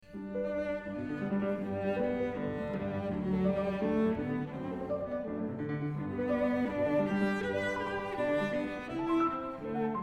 12 Variations for Cello and Piano

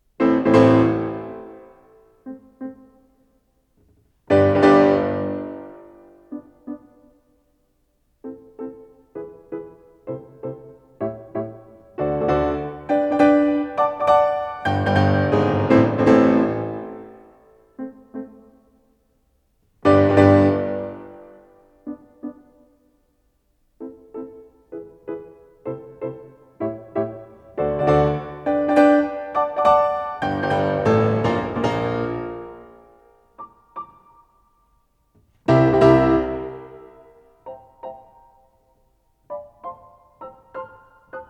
33 Piano Variations in C; Anton Diabelli

